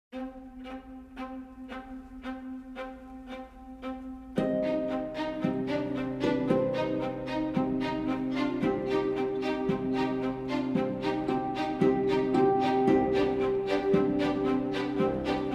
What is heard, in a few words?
Moderato Con Brio for Violin; Succession Season 2